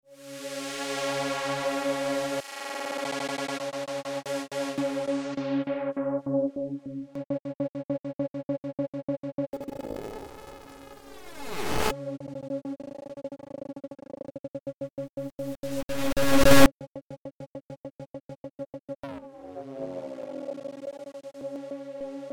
Stutter Edit

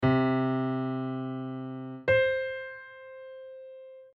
Piano Comp Test

